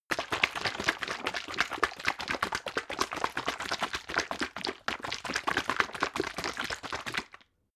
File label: bottle syrup shake long; berufe